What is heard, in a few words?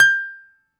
BALALAIKA, Prima Balalaika, Plucked Strings